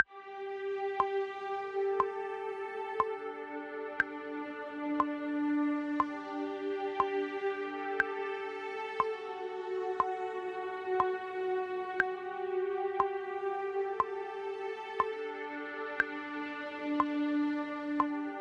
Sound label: strings